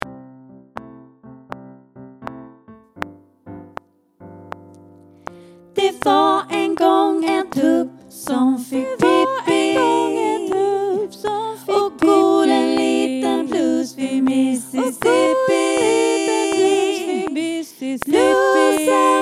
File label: Blues